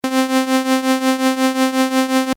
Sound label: lfo amp; nord3